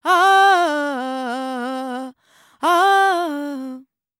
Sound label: World Voices, INDIA; ILIO; Vocal Planet